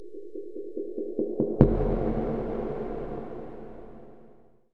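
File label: tormentor ambience; ambient soundscapes; dota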